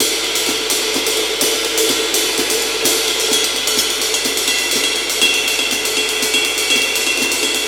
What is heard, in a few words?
125 Classic Breaks, 125